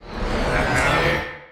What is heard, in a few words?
Punch Left Speed Up; Pitch Black - A Detective's Demise